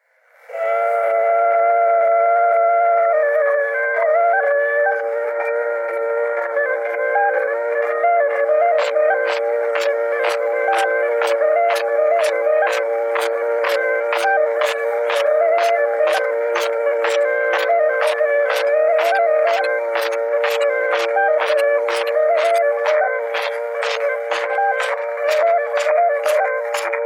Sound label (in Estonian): Torupilli Jussi lugu, Emmaste, torupill, folk; Juhan Maaker